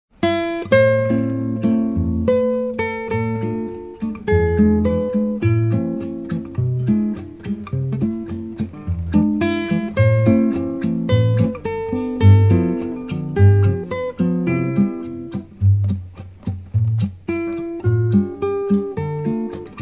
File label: orphee